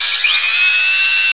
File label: ministrider pre flechette; npc; Half Life 2; Episode 2